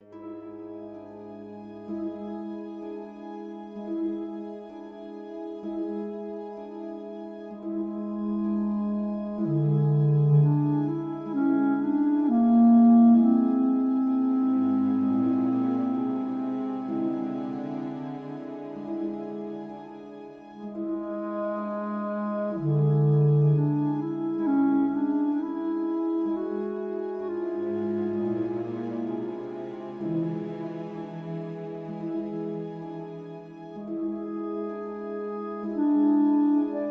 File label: Music Walk Mystery Theme Bpm48 Fadein Loop; asterix obelix slap them all 2